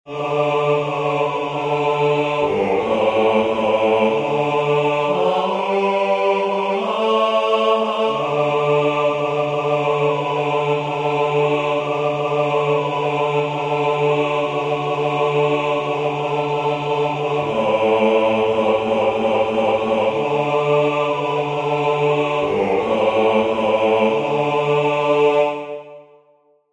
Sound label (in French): basse, chorale; Joy to the world; Concert du 13 décembre 2024 en l'Église St Pierre - St Paul